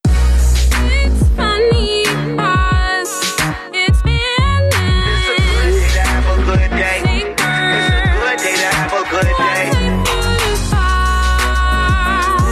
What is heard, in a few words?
saber music